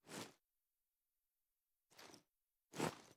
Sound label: plr ar reload empty xmag cloth, weapons; viper